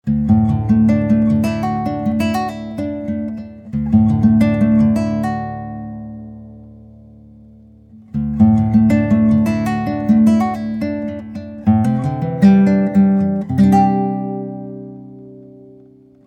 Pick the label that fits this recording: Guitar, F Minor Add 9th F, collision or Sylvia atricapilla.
Guitar